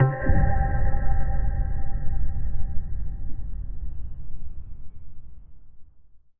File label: Impact Boom Ping; bottlerocket